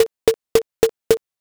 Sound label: mokugyo